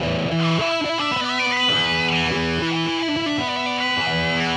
Electric Guitar, Loops; Guitars

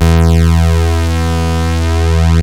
pwm solo, yamaha rm1x synth

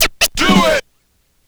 Turntables

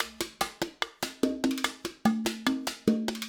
Acoustic Percussion

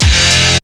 OVER HIT, STAB; SoundFactory; SMPMANIA

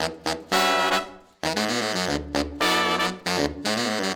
Horns Section

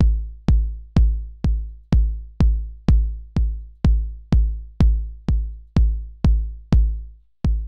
Kick Loop, Drums, Dance Beats

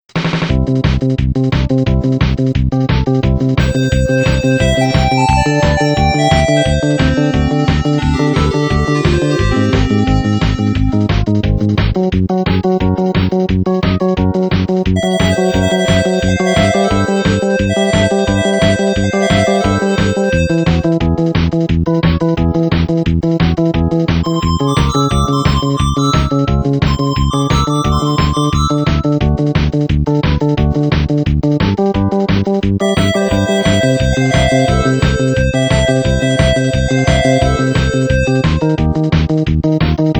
Menu, Music; Bombzuka

